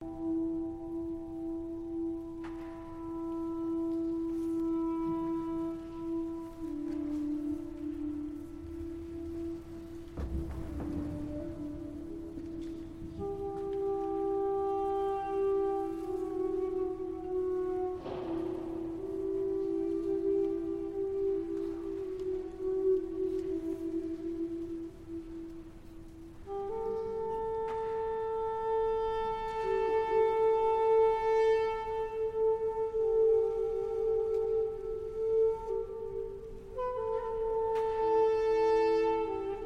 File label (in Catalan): Improvisació duduk & flauta; Llibre Vermell de Montserrat